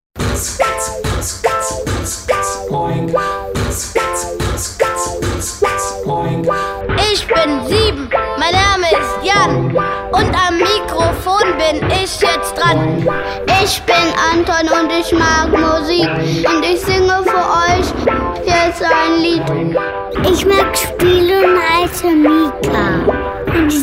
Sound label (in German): Beatbox Eltern mit Rap